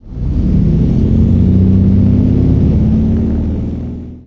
leo2a5 engine int load, tanks; War Thunder

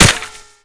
impact dirt; Modern Warfare

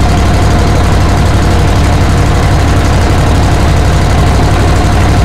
tiger diesel engine rpm load, engines tanks; War Thunder

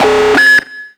ui nuke bomb; user interface

